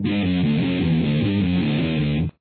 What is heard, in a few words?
out, guitar